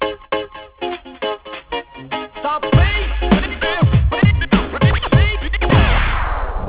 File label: Sub Bass